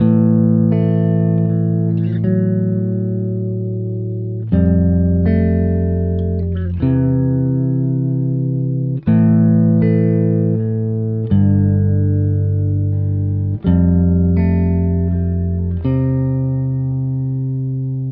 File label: guitar, Sad